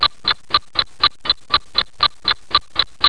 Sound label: CLOCKTIC; My Science Fair